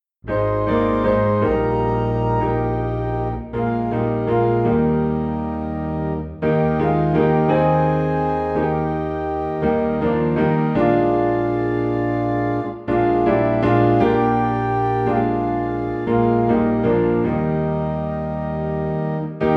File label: Piano and Organ - High in E Flat; Have Thine Own Way Lord